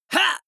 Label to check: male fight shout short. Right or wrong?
Right